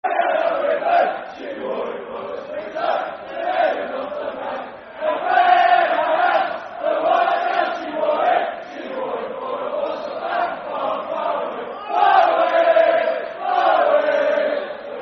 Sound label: Football Chant (Soccer Song); Walsall Wfc; fanchants